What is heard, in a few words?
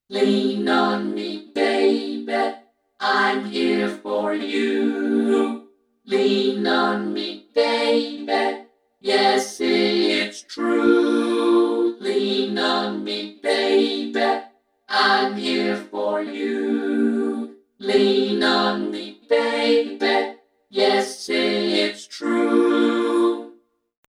kids choir